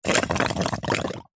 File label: foley balloon pump in water bubbles gurgle squelch